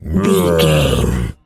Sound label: zombie voice grunt